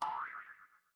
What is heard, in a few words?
cartoon boing